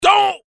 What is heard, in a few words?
wrong